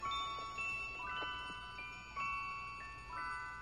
bell; grinch

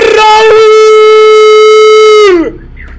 rauuuuuuuuul warcry; drdisrespect

